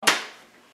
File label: Banana Slap; AngryFlash